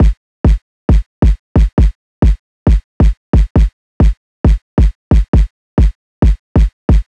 Drum Loop 135 BPM Kick, Hip Hop; Cymatics; She Goes

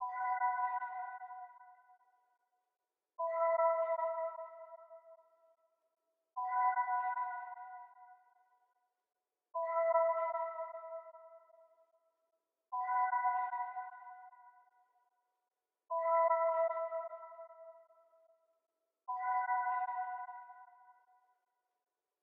151 BPM B Min Keys; Melody; Trap; Loop Stems; Cymatics; 2022 Melody Collection - Trap Pt 1; G Wagon

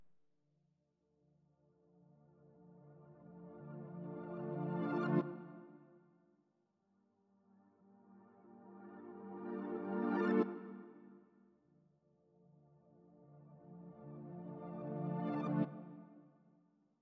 92 BPM D Min Reverse Keys; Melody; Loop Stems; Cymatics; 2022 Melody Collection Platinum Expansion - Pt 2; Top Floor

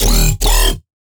Bass D# Wonky Talk; Dubstep; Bass One Shots; Ghosthack; Dubstep Sound Arsenal

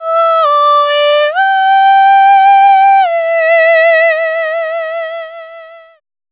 Vocal Lines - Am 70Bpm

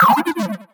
Retro FX; Video Game; Cymatics; Video Game Sample Pack Vol 1

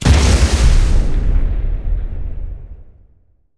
explo grenade; Explosions; PremiumBeat

